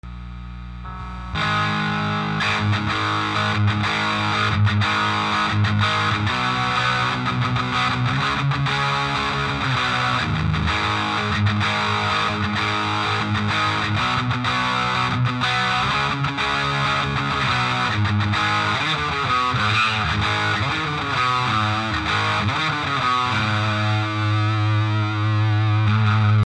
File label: tele rear